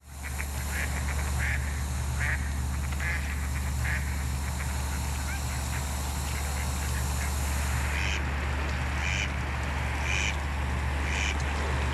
colvert, oiseaux; rendez-vous avec les oiseaux

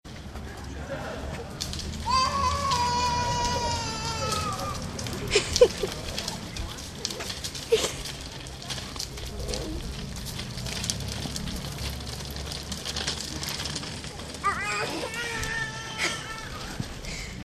la creche

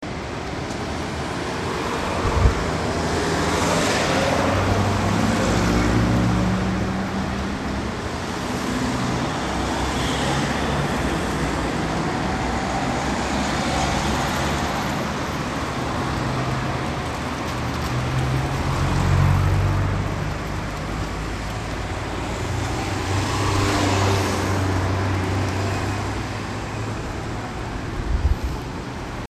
la circulation

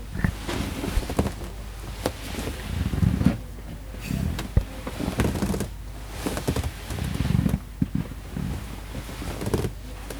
matelas

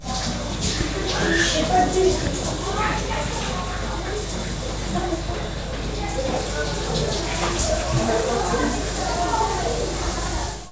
Sous la douche

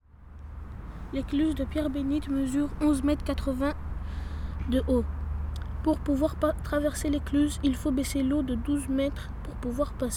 ecluse; duclos

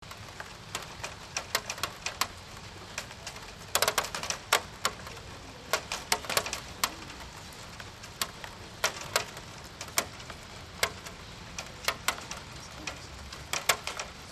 la pluie metallique

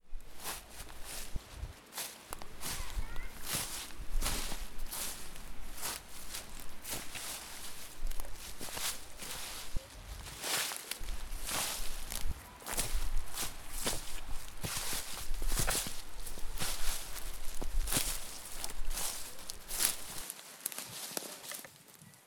balade

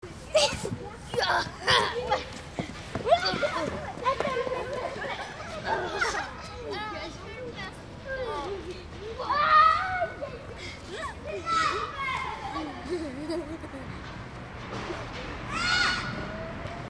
les jeux

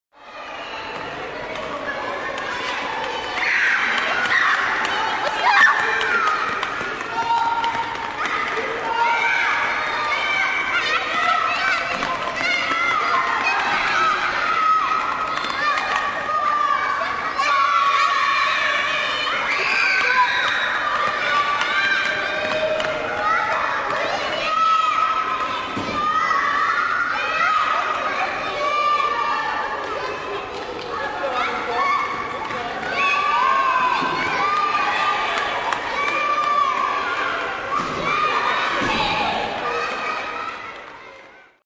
Gymnase

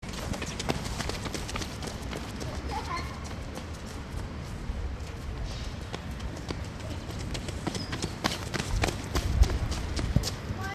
les enfants dans le square

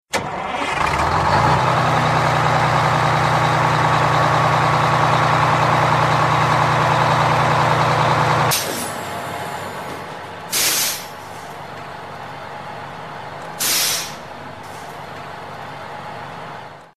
bus

